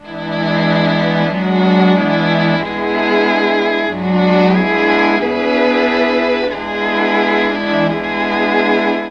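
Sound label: bass strings, Mellotron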